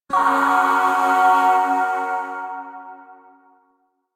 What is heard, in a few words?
Shawzin Yo Chord I; Music; Chords; Warframe; Zariman Void Shawzin; DE